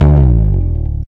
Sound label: Acoustic fretless Fall